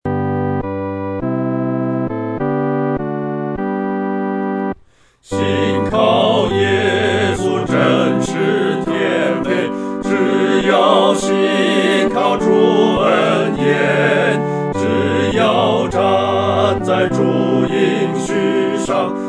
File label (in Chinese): 合唱 四声部; 信靠耶稣真是甜美